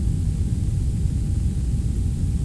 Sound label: room tone